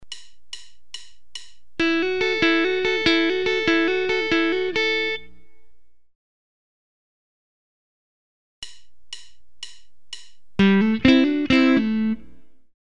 Single-note hammer-ons, double-stop hammer-ons, guitar